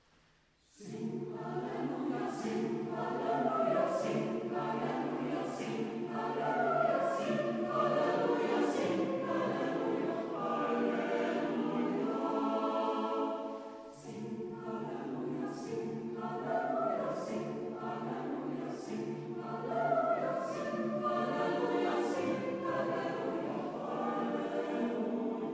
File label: Young Sing Alleluia